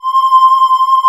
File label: MATRIX 12, OB Synths; E-MU Formula 4000 Series Vol. 3; Analog Odyssey